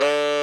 SAX XSAX, Saxophones; All Saxes

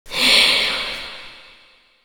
VOC; Best Service ProSamples vol.48 - Disco Fever; AKAI

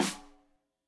Rim Shot Stereo; Deeply Sampled Drums; SMDrum

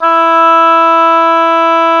oboe, mf f; Roland L-CDX-03 Disk 1; Oboe 2&3

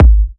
G#, kicks; markus hakala kicks

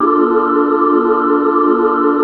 PAD, Drum & Bass, 160 BPM; Ueberschall; Drum & Bass Resonance

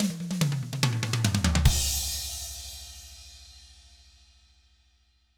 drums, 50s rock; ILIO; Double Platinum Drums 1

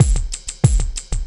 Loop Bit, Processed Percussion; Sonic Foundry (Sony Creative Software); Rhythmicronics

